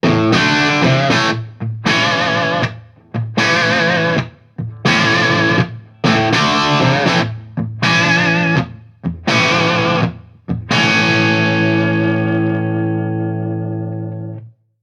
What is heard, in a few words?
Blues, ringtones; Marshall; London KB 1501